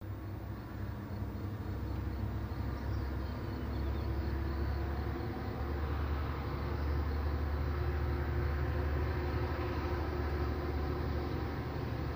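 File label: Train By Med Spd No Horn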